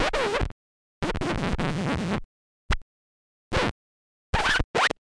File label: Needle Rip Sound